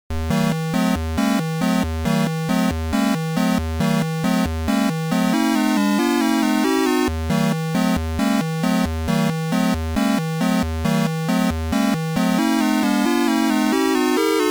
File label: Battle; Giant Character Battle; Xanadu